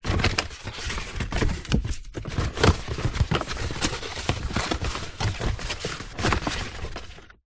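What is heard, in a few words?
foley cardboard box fast food empty flaps movements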